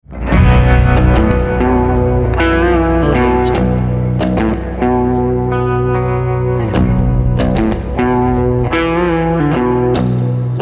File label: fashion movie rock pack; cinematic-trailers